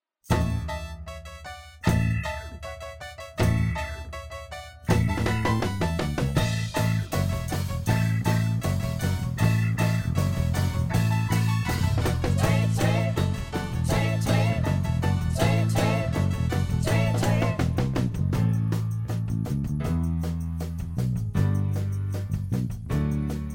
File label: Instrumental